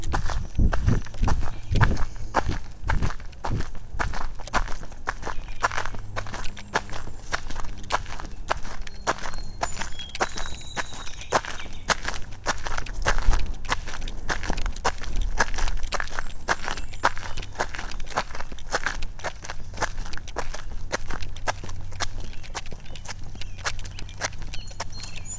Skipping gravel and pavement while holding microphone